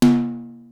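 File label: Simmons SDS-9 high tom; Factory B4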